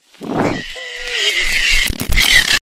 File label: on fire scream